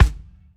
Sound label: kick berlin